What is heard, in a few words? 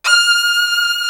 SOLO VIOLIN; East West; Global Sounds